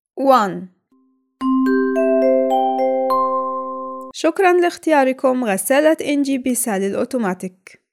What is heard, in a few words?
Music